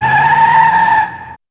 fek; Pure Pinball

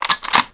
porgo; Pure Pinball

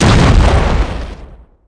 agyu; Pure Pinball

